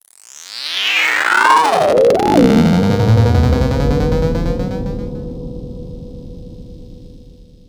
weapon Charge

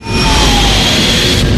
Ship morph

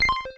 Pong Score

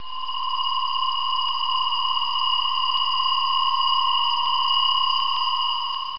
gravity field

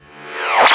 weapon Change

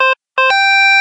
Player Win Score

